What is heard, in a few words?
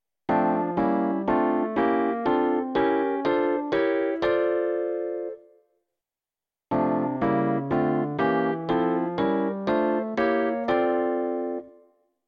minor block